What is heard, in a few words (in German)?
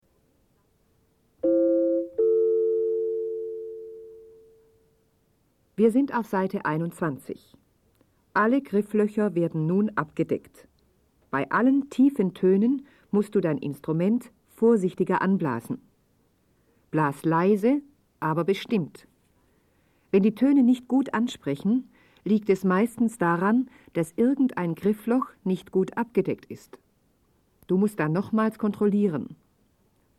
Spiel Blockfloete